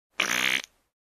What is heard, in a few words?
Fart like sound